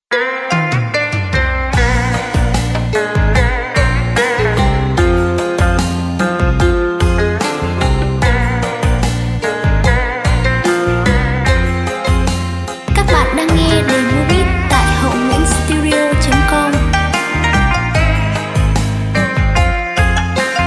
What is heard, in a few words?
beat, playback